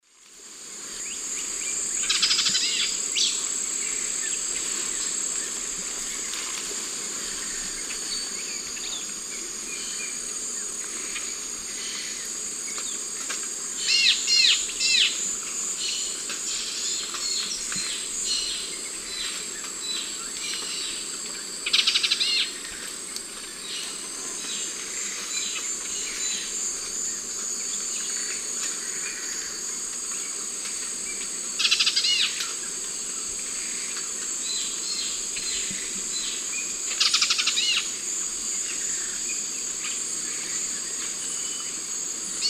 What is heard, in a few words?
Microbates cinereiventris, call and alarm call; S. America; Eastern Lowlands